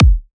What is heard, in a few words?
Riddim Kick